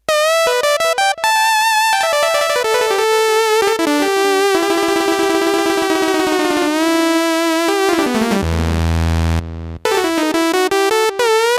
Noise Lead, M-Audio Venom

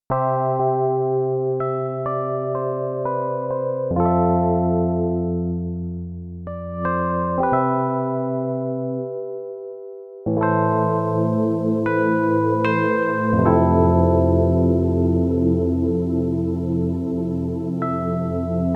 Nu Reso Piano, Korg Radias-R

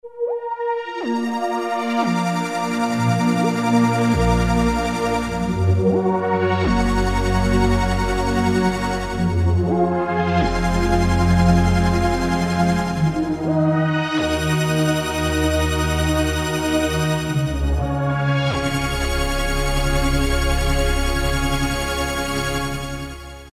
Star Dust, Yamaha QY70; XG Voice examples

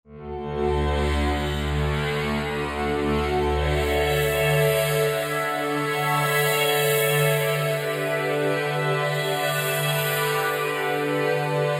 Pressure Glass, Korg Wavestation A-D

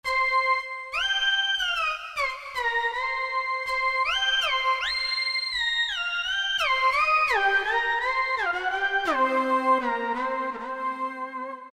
Def Lead, Roland SR-JV80-06 Dance

